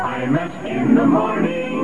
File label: shout; Imus Shout - Early 70's; JAM Creative Productions, Inc.